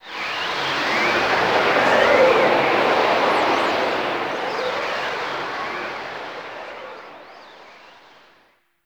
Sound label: Courtyard Magic Wind; Ambience; Gameplay; Warframe; Duviri